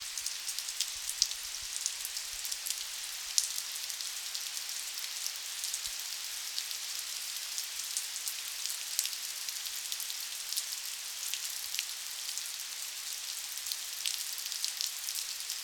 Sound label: Rain Close